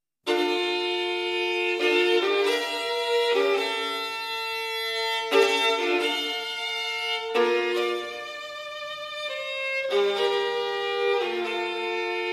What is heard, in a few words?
violin